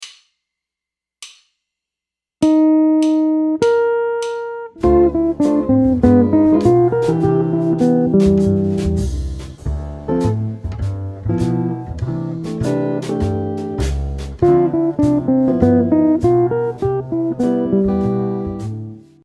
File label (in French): ii v7 altéré 5 9 en c, guitare jazz